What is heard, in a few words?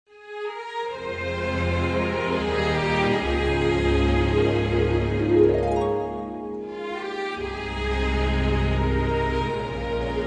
Singers With Orchestra